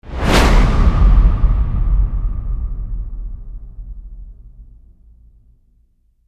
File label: Viking Hit Swell; Ninja Tracks